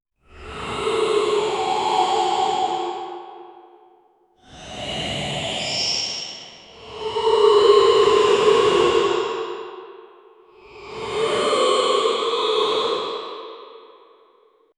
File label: fantasy sleeping dragon